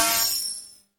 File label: crystal activate; Puzzle; puzzle mechanics